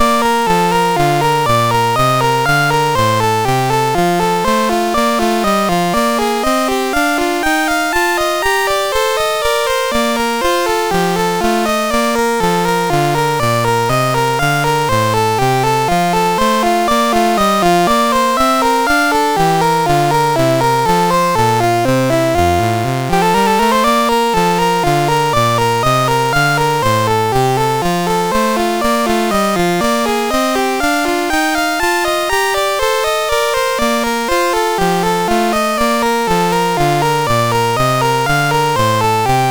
MOS6581; MOS6581R2

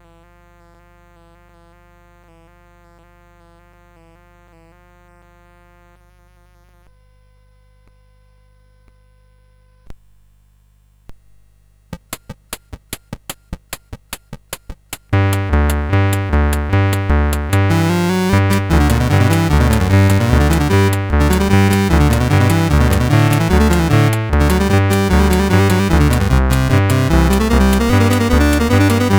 Sax, CSG8580R5

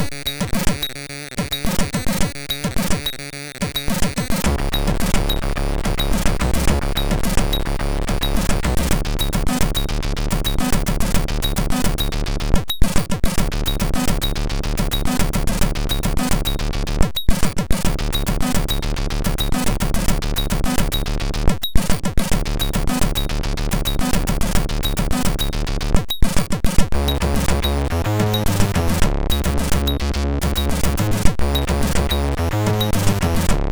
Geister, MOS6581R3

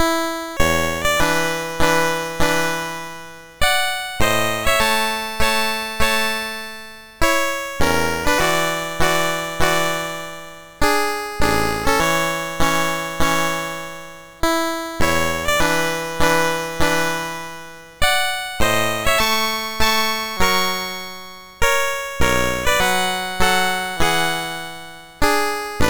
Prelude in A Major, MOS6581; Op28 No8; MOS6581R3

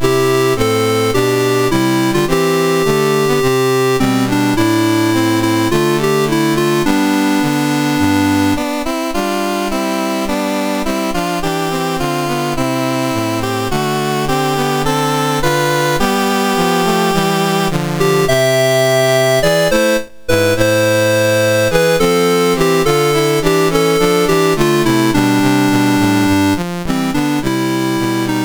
MOS6581R2, National Anthems; Mauritius

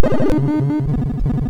Die Alien Slime, MOS6581R2

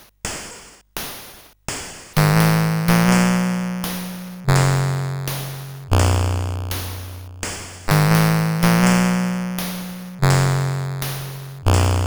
sid CSG8580R5

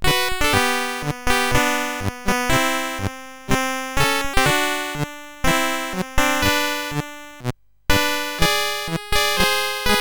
sid, CSG8580R5; Aquantor

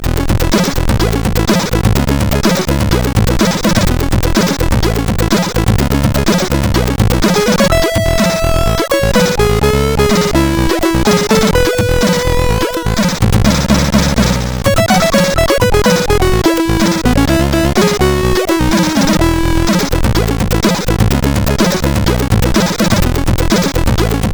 sid CSG8580R5

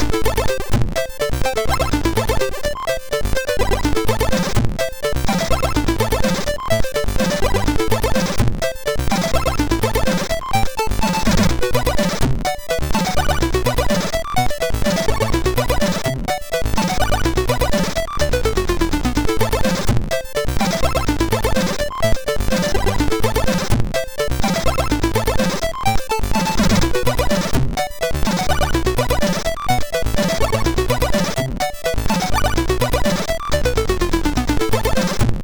sid MOS6581R2

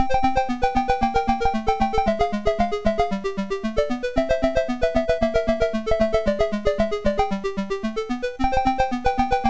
sid MOS6581R2; hvsc

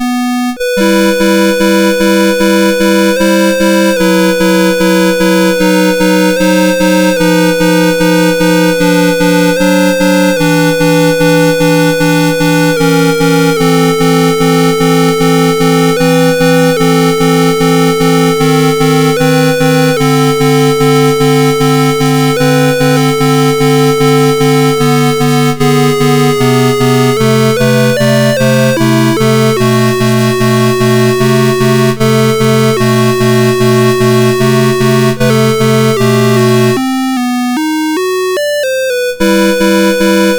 Prelude in E Minor, MOS6581R2

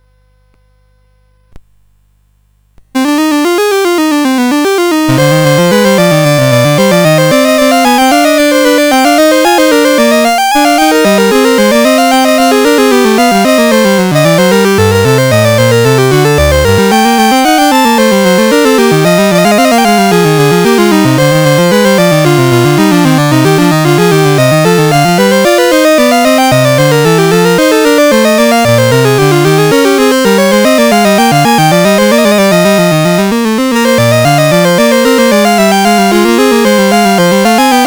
Fantasy in C Minor, CSG8580R5

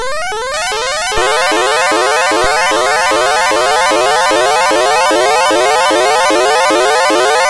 Commodore 64 Music, MOS6581R2

